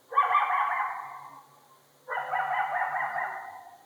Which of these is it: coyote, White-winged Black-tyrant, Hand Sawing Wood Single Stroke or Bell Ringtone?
coyote